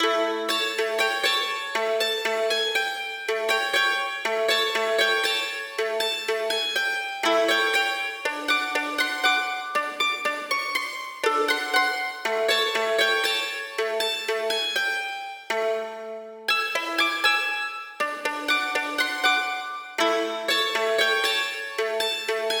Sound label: 7th chord fingerstyle